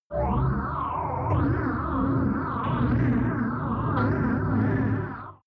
sci-fi creeping loneliness, horror

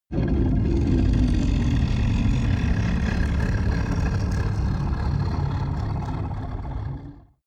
crumble soundscape

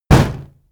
fire hit; whooshes rises; hits impacts

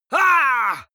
male fight pain shout; vocals; comedy-cartoon; soundpool

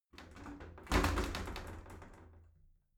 shower slide door rattle hit

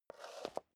step high heels concrete scuff; schritte; Footsteps; menschen

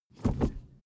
suv idle 1st gear change fast; verkehr transport; autos

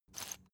salt mill squeak soft

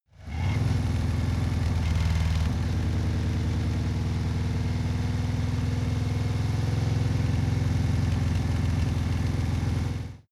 motorcycle harley accelerate slowly; motorraeder; verkehr transport

